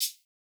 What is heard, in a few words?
Electro hihat; Reference Beat